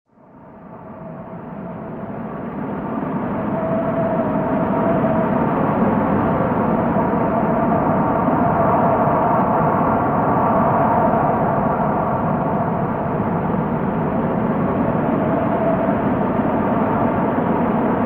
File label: wind overdrived